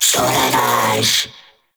summon vocal; Warframe; Uriel; Vythelas

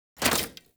Rifle Mech Release; Warframe; Weapons; Thanotech

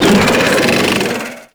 Thumper Pain Groan; Grineer Thumper Bot; Warframe

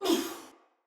Pain, Soldier Female; Battle; Barks; Dialog; Sinecure Soldier Female A

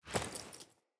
Foley Action Default Jump, Default Clothing; Player

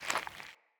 Stuck Sludge Foley Move; Encounters; Animal Rescue

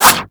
roulette projectile jam; Cowgirl

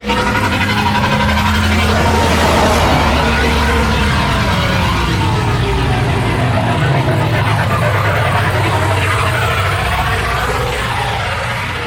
Liset Level Docking, Vehicles; Warframe; WM Liset

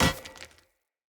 Short Sword Medium Impact Rock

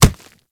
Kubrow Step Dirt; Warframe

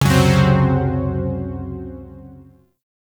Shawzin Hirajoshi Chord B; Warframe; Zariman Shawzin; Chords

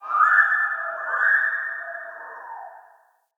Day Distant Creature

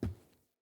Grunt Boot Walk Wood, Footsteps, Boots; Enemy; Sinecure; Melee Grunt

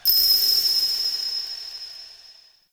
Interior Orbital Station Ring; Warframe; Tau

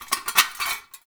Metal Rattle; Props; Warframe; Tau; Ambience

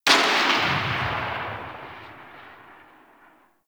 Tail; Hek

